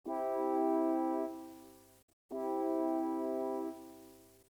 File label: c maj chord pure